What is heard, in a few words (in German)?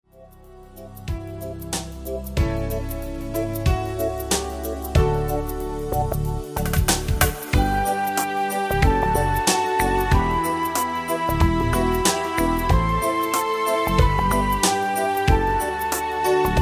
PLAYBACK Suedseenacht